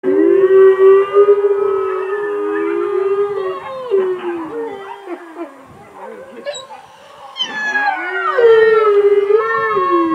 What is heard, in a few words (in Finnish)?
suden ulvonta